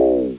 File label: Door